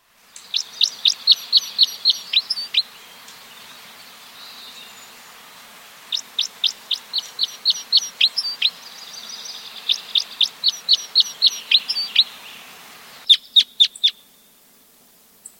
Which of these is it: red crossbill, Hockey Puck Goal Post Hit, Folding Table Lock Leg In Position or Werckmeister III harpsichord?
red crossbill